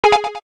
digital, keytone; SKY IM-A710K VEGA Xpress

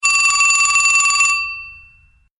Vintage Telephone

